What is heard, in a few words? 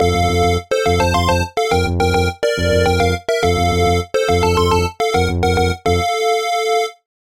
Intro Fanfare; Xevious